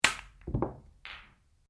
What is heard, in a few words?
BILLIARDS CUE CUSHION CUSHION BALL, Home billiards table; Pool; Sports